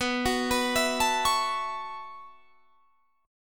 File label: B 9th Suspended 4th B down; arpeggiated